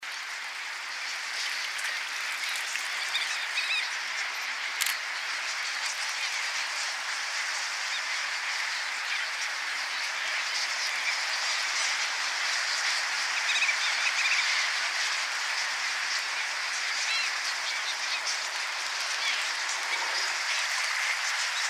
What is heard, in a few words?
Fairy prion